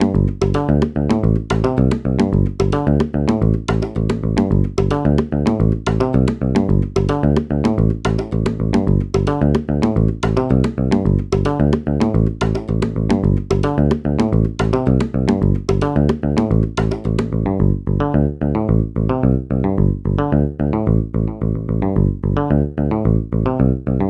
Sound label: 110 BPM